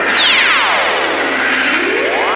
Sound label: Electro FX; Ueb HE Electro FX